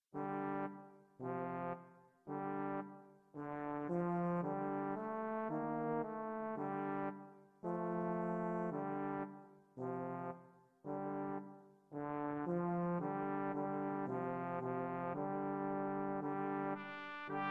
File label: brass quartet